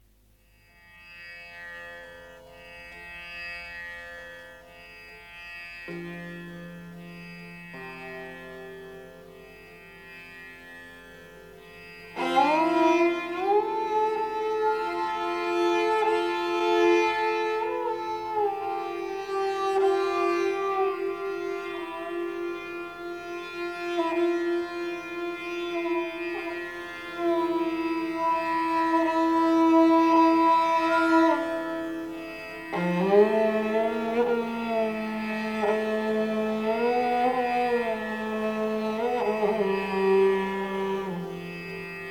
maru bihag gat

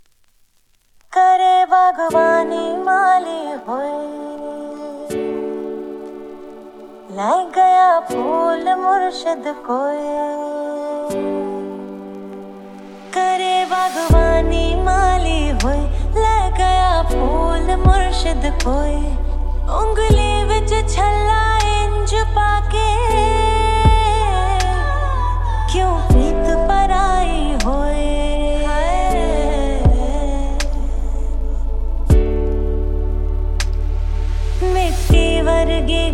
lofi

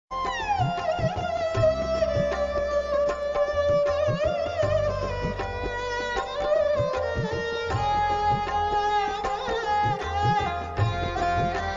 unplugged

